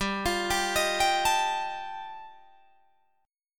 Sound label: G 7th Suspended 2nd Sharp 5th G chord, Guitar Drop D